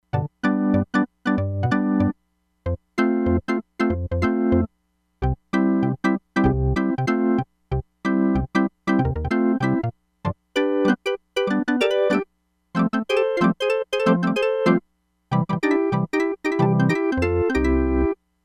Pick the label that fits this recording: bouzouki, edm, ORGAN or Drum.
ORGAN